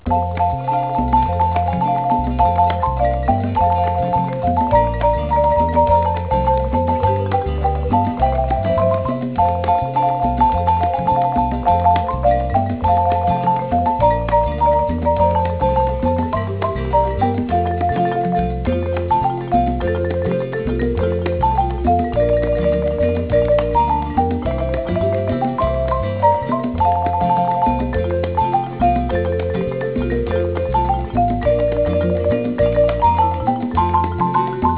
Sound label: jingle